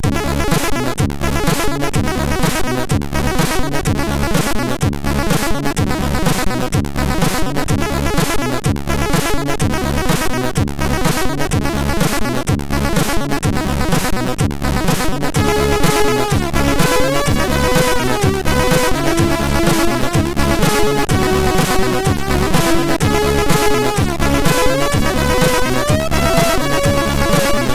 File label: Game Muzak, MOS6581R4; Moon